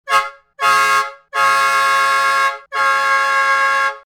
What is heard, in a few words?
caboose, train horn samples